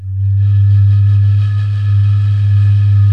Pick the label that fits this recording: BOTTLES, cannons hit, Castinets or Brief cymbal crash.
BOTTLES